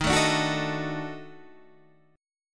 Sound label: D sharp Minor Major 7th Flat 5th D sharp Guitar Open D